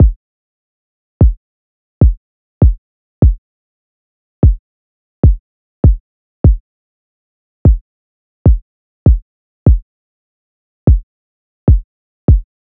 Drum Loop - 149 BPM Kick; Loop Stems; Cymatics; DOPE DRUMS VOL. 1; Promise

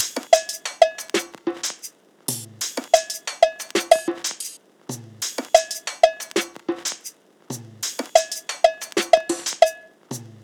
Temple Perc Loop 92 BPM; Cymatics; King Drum Loops

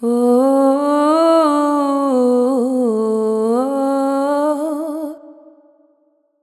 Ohoh, Vocals, Wet; GhostHack

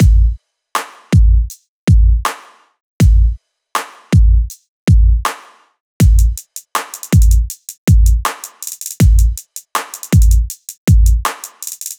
Hip Hop 80 Main; Drums; Drum Construction Kits; Loops; Ghosthack; Drum Mega Bundle; Drum Hero 5; Kit Hip Hop 1

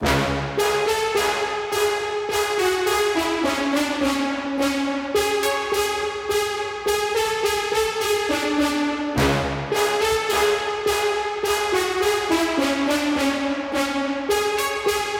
105 BPM C# Min Brass; Loop Stems; Cymatics; Go To War

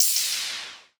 ride, cymbals, drum one shots; Rides; Cymatics; Cobra Hip Hop Sample Pack

